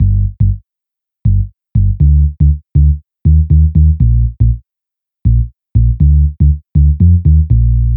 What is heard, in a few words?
Folk Indie